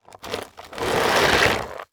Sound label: Clunky Rattle Scrape; Foley